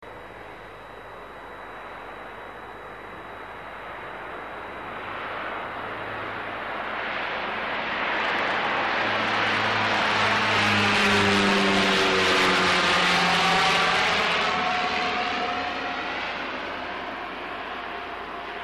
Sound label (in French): avion a helice; propellerflugzeug; laerm